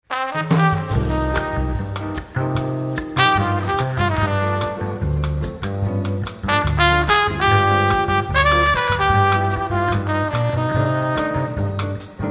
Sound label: Bossa